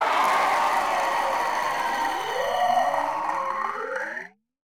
Crowd Cheering; Pitch Black Audio Pong

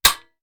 Rifle Foley; Sound Ideas; Guns

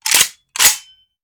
Machine Gun Foley; Sound Ideas; Guns

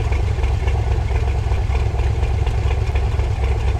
65 Corvette Idle Loop, Automobiles

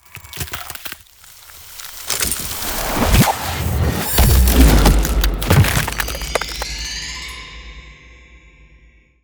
Ice Spell Damage Direct Chilling Arrow; Arctic Aura

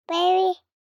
BABIES TALK Boy 12 months Baby; Baby Boom

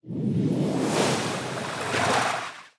Water Spell Ready; AudioWizards

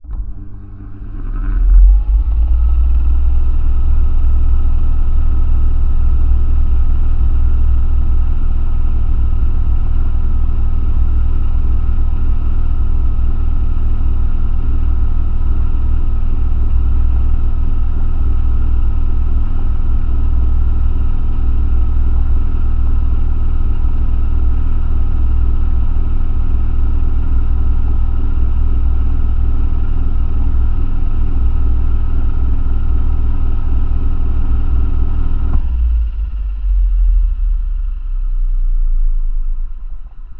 fan; NS Studios - ultra sound collection

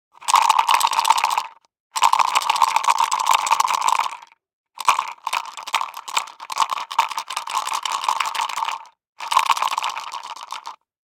DICE in Plastic Cup, Shake, 3 Three Dice; Samples; ArticulatedSounds; DICE Sfx Party (Extended)

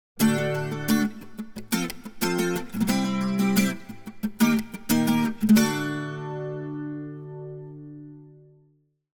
Acoustic Guitar - Happy Rhythm; Hollywood Edge; sound designer's toolkit v2

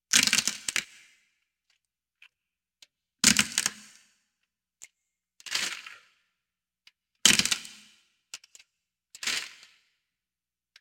Bones Cracking; Halloween Havoc - Sound Effects; Ktel

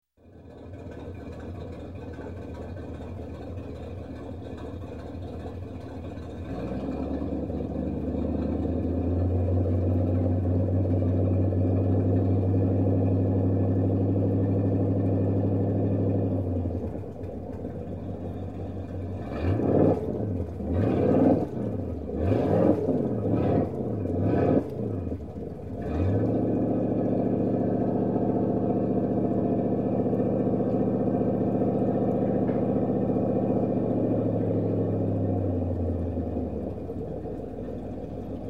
Boat Inboard, Idle, Several Revs; Chris Craft; Shut Off; Sound Ideas; Series 2000

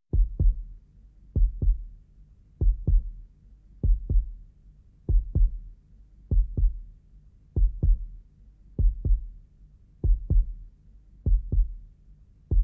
Scary Sounds Heartbeat; 1001 Sound Effects; Halloween Horror Sounds Effects

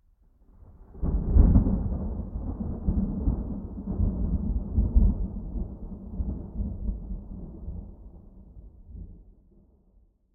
Thunder moderately distant; boom library; Thunder And Rain

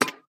Peg Leg Footstep

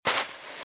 Footsteps Sand Dry Walk Right; RadwinIsland

